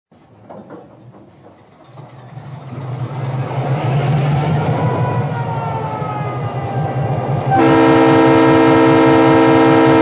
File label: EMD F3